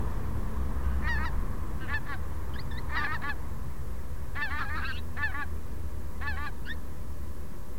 Pink footed Goose Anser brachyrhynchus

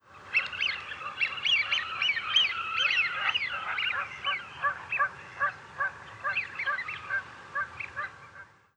Eurasian Wigeon Mareca penelope

